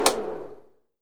Projector End

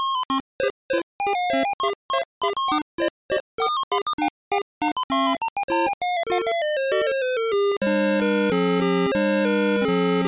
Pirate Ship Cutscene (Sailor's Hornpipe); King's Quest III: To Heir Is Human; ibm pcjr

